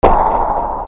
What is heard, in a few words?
INDUSTRY